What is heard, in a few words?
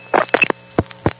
kacheep